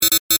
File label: Multimedia Negative, Technology, Multimedia, Negative, Element; Alerts; BlastWave